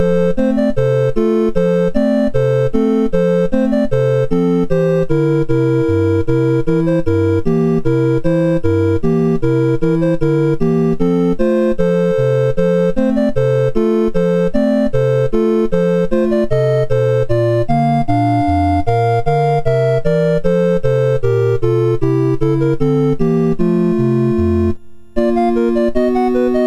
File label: MOS6581R2, sid; Donald Ducks Playground